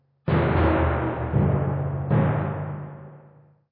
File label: Tymp Hit; Lost Zasad